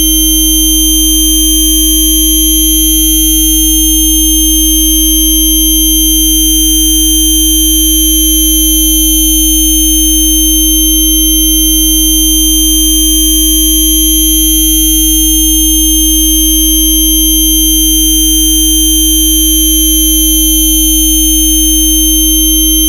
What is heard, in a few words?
Multifrequency; Rohde & Schwarz; UPA; Rohde & Schwarz UPA-CD